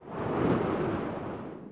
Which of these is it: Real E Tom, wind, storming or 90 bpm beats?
wind